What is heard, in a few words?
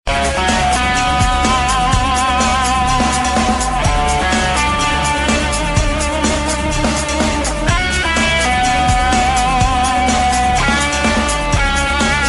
guitar tone ringtone